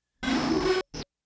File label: video static distortion short bursts; rizzy; prosoundfx